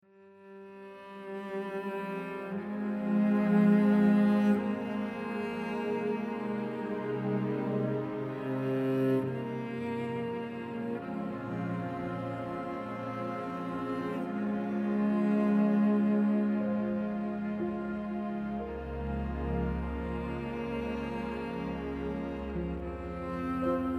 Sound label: Cello Melody Sad Piano and Strings